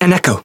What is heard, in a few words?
Voice attack; clarte